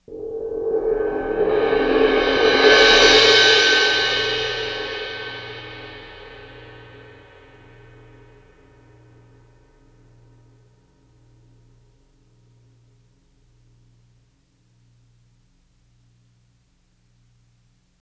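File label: c suspended cymbal medium roll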